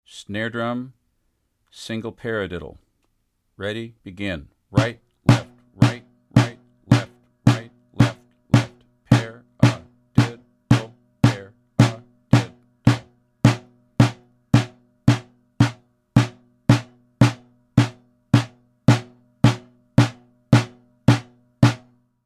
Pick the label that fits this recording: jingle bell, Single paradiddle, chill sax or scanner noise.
Single paradiddle